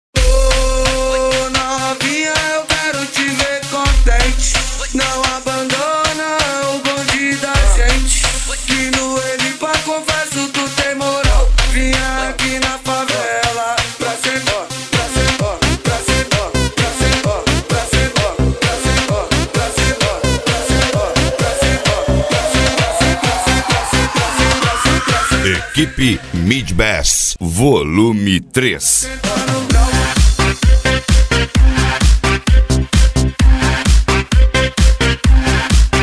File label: mid bass